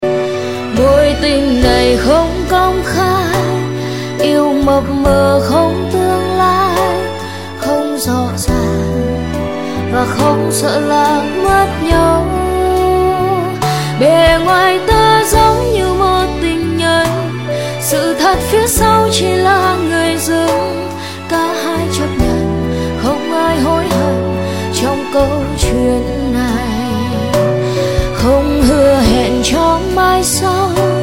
mp3 giong nu cover

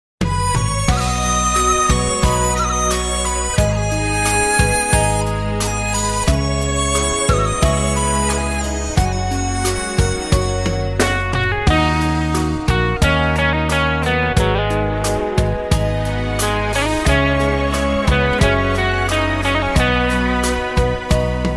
Nhac Khong Loi

